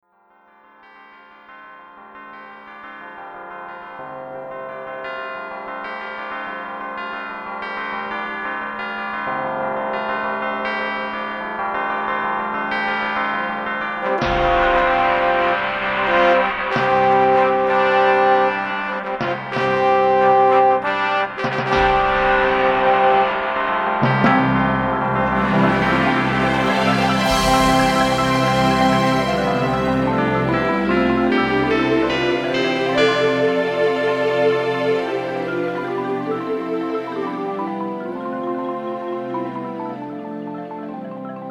orch

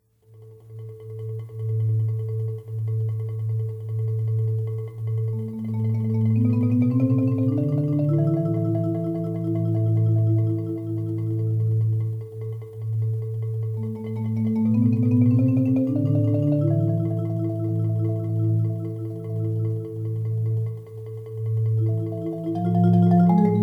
solo

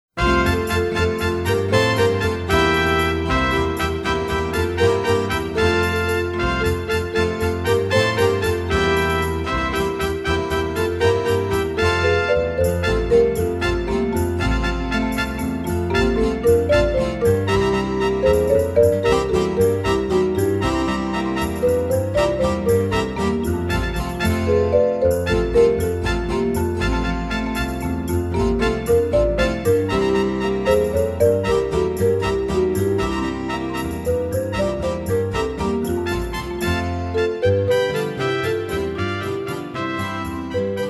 orch

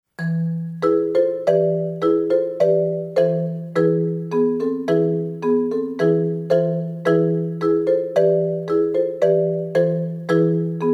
malaguena solo

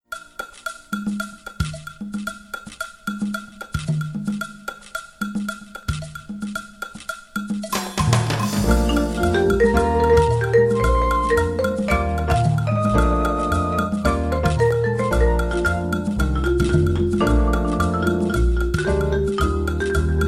salsa

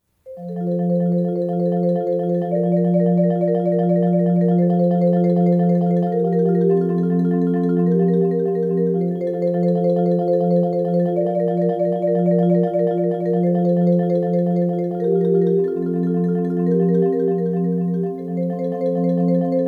grand march solo excerpt

